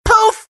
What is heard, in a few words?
poof